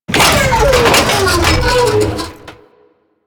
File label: Thumper Bot Death C; Warframe; Grineer